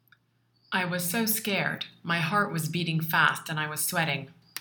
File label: heart